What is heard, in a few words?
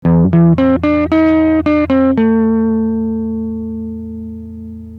clean sat max; fetpa